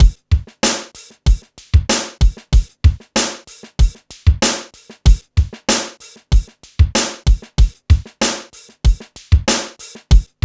FUNK, Funky Xtreams; INIS

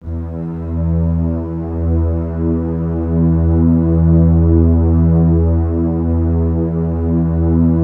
E1, Keypad; Standard Instr; Keypad 3

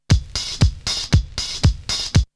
Drumloop; ZERO G - Datafile DISC 2

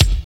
KIK DANCE, Dance Kicks; Roland L-CDX-01

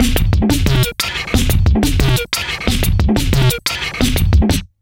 090bpm, Downbeat Dance Grooves

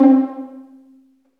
Vlns Pizz; Roland L-CD702; Vls Pizz

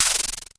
NOIZ MOD, Dual-Percussion-Synthesiser; Klone; KLONE FX; Drum Machines

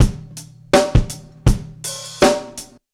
Hiphop loop 81 bpm; Hip-Hop Street Beats Construction Kit; Street Beats by Poogie Bell

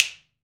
finger pops; AKAI S-Series CD-ROM Sound Library VOL-5

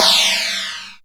FX Cymbals, Percussion; Dance Synths

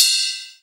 Ride Cymbal

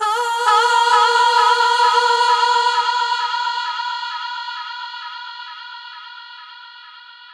Female Effected, Effected Vocals; Hip-Hop and RnB Vocals

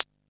Hat, Drum & Bass

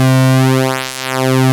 ARP Synths, PULSE MOD C2; E-MU Formula 4000 Series Vol. 3; Analog Odyssey

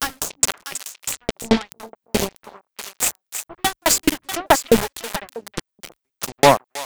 Envelope Beat; Drums & Drones

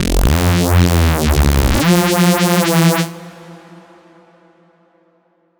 BASS SHOT Reese

